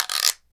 Quide Long, Guiro, Percussion; Roland BK-5

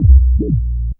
Future Bass; Drum & Bass; Fluid Dynamics

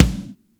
KCK, SONIC DRUMS; Greytsounds VOL-1

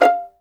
STR 2 PIZZ F5, Vlns Pizz; Roland L-CD702

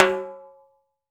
TIMBA, PERCUSSION; AKAI S6000 CD-ROM - Volume 5; GM PERCUSSION KIT 2

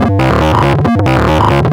bass licks, techno, 138, d#; instrument-loops

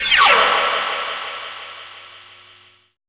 FLY-BY; Spectrasonic Distorted Reality 1

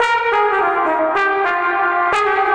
Trombone Effect, effected trombone

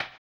HAMBONE; ILIO; Vocal Planet VOL-2; Blues & Soul

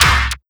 Zappy Kick

